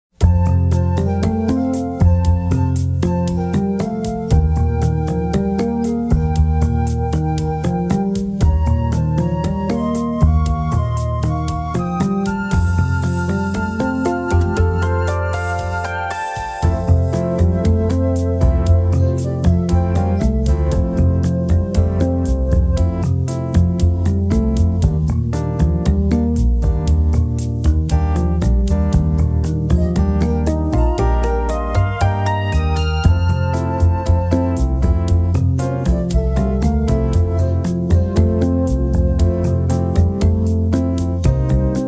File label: Looner Landscape SLOWER WITHOUT melody fade